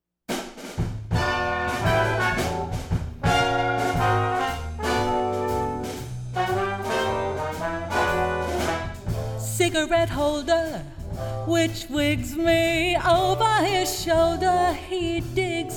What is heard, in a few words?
Jazz Combo Vocal; Premier